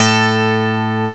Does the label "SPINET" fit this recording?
Yes